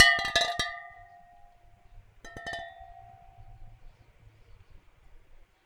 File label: Water Clink, Glass Clink In Water